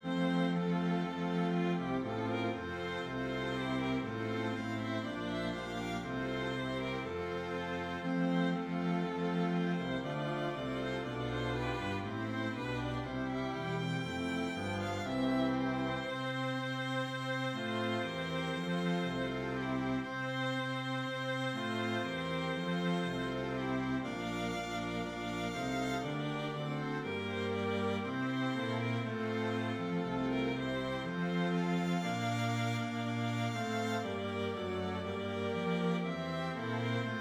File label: Hymn Strings